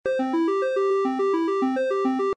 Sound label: reel run; games; poker; American Poker The Legends II